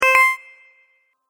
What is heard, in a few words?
Approved Interface Sound, chirp